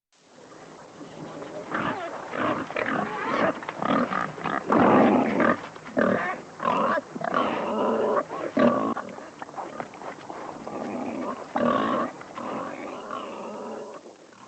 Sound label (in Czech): Prase divoke